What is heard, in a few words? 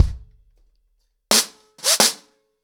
90 BPM, acoustic drums, drumloops; The Roots Drumloops